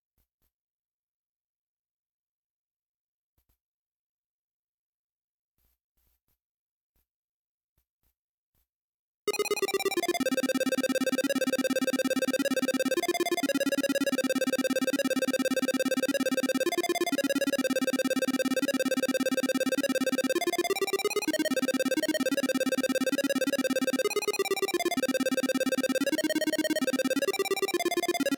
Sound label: Melody; chippy